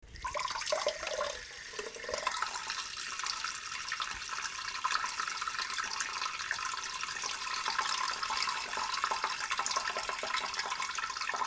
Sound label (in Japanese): ぼちゃちゃしょううーおしっこ